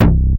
SHORT BS C2, SHORT BASS; Best Service Dream Experience

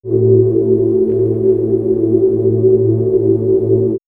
Cabin Hum, ambient synth fx; X-Static Goldmine

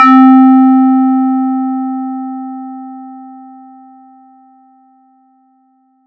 FX RND BEL; ModelCycles

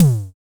DSM2 Tom; MPC DSM-2

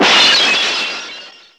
glass crash, Sound Effects

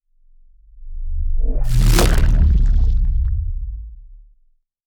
Impact

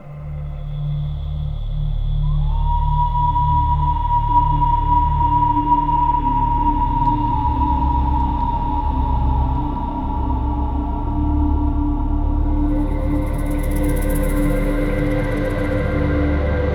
Percscape; Sonic Foundry (Sony Creative Software); Ma Ja Le; Soundworlds

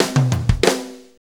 6-8 Fill, Drum Tools

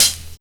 DRMS, Underground Hip Hop

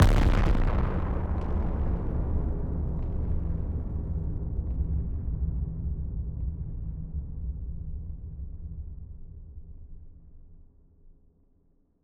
Blast, Explosions

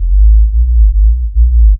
DR. LOOP, BASS; X-Static Goldmine 3

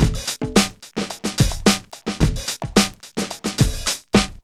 BOOMING; Zero-G; Funky Elements

